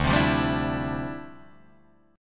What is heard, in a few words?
D Minor 11th D down Guitar Drop D